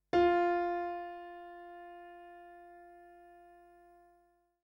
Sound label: Subdominant